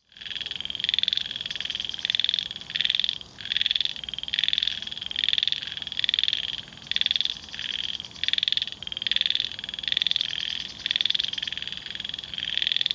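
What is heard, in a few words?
microhyla heymonsi, Dark-sided Chorus Frog call; Springleaf, Singapore, 20 Nov 2016, 1930 h; Recorded after heavy rain in a flooded field adjacent to secondary forest habitat.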